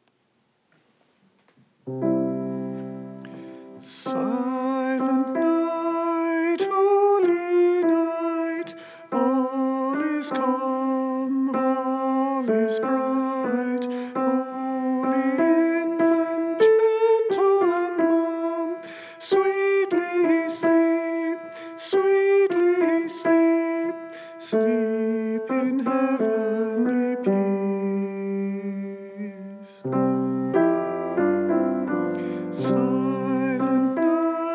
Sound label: Alto